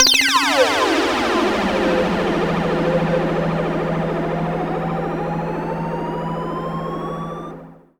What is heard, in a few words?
Bomb Drop, Synth SFX; Sonic Foundry (Sony Creative Software); The Electronic Music Manuscript Collection